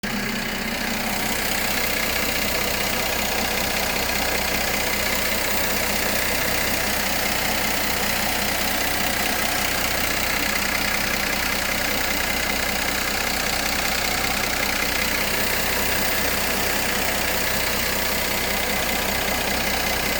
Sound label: temps after solenoid hack idling; w212 amg; post esp